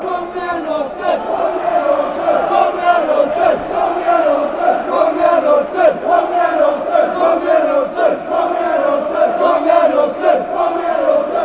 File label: chant, norway; aalesunds